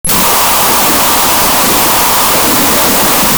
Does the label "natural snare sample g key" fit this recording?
No